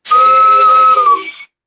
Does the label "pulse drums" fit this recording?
No